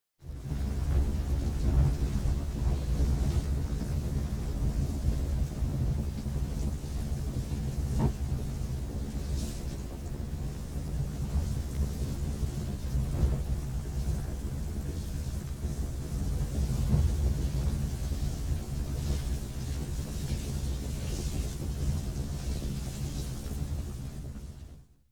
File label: Rain